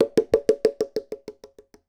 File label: Fill, Conga; musicradar